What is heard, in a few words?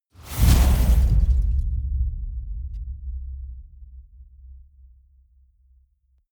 gust hit